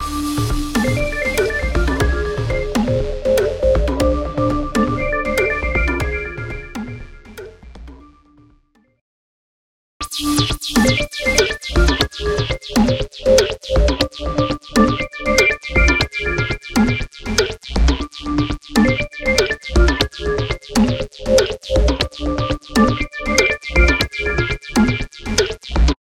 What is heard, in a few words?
Sampler Filter